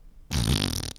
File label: fart